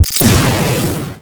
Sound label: weapon disintegrate; citadel; hl2